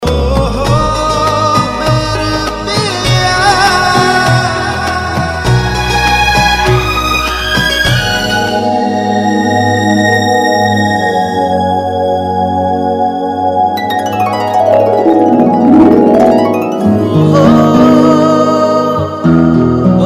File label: Tune; TV Serial Tone; Sony; Hum Hain Na